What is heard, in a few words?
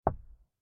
jump; pygame; vertical platformer